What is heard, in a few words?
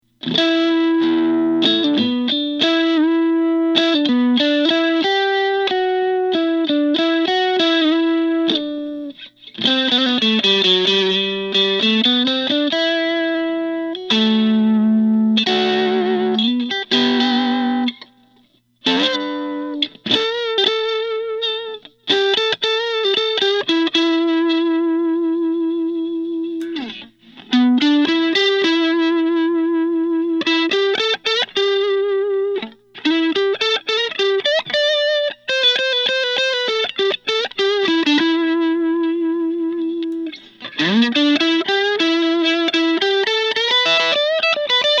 marchione vintage tremolo translucent black